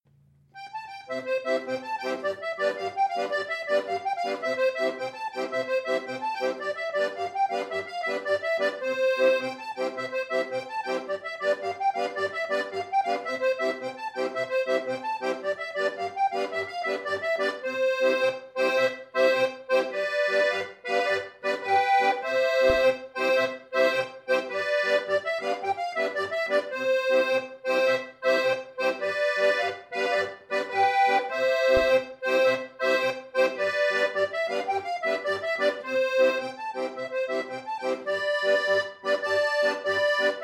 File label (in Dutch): tarantella uit Calabrie